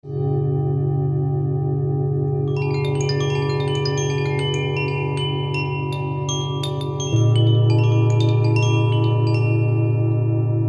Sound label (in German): Klangschalen Meditationen